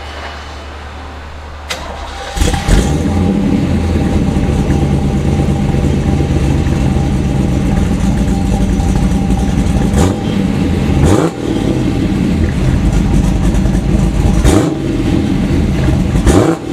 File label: muffler; hooker; aero